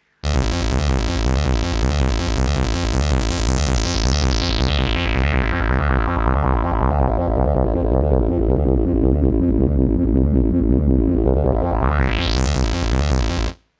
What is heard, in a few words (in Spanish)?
arpegio; maestro